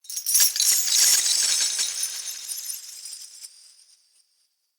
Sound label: Sky Fortress Chain Move Trippy; Ode Sky Fortress; Tunnel